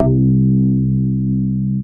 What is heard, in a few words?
Bass Note C